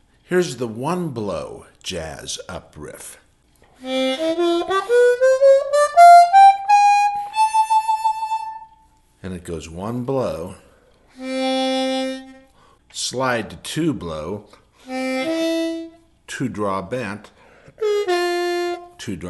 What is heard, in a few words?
3 Blow Jazz Up Riff, harmonica